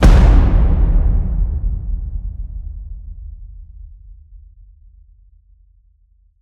HIT Epic Drums, Cinematic Hits Designed

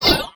Pnuematic Flange; Sci Fi; 1001 Sound Effects

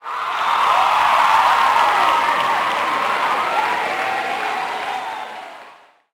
Crowd Cheer; Pitch Black Audio Pong

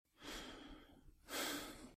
Breathe Half breathless; Eden; RadwinIsland

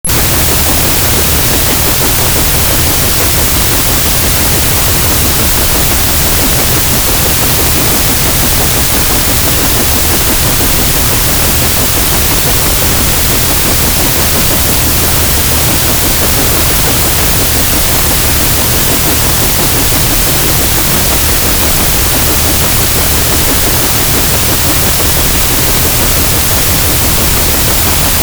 Traffic Helicopter; 1001 Sound Effects; Foley

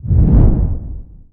Pickup Speed

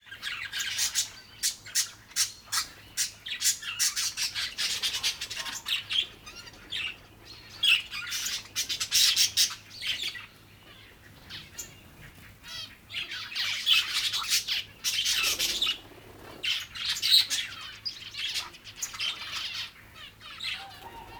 birds exotic; best service Blue Box

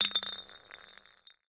alley bottle; DeadFright

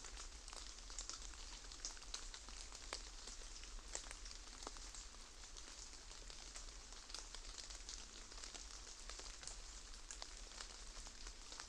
Rain very light patters aftermath; boom library; Thunder And Rain

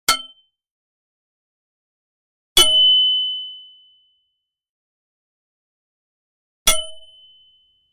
Metal Impacts, Martial Arts and Human Impacts; Hollywood Edge

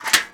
Menu Click

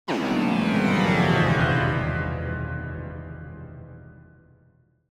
Symphonic Pad Going Down Pad - Heavy Down; Hollywood Edge; sound designer's toolkit v2

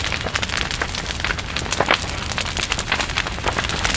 Fire Campfire Loop; Destruction

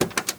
Mustang Door Open; Automobiles

